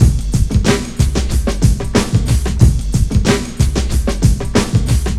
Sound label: LOOP; SoundFactory; DRUMLOOP